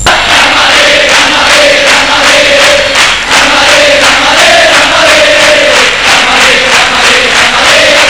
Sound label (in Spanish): ambiente